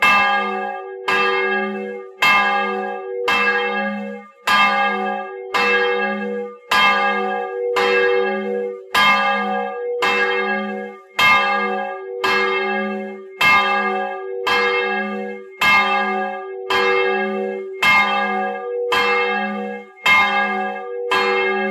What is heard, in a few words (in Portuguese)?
sino de 550 kg